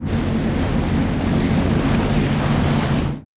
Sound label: ferry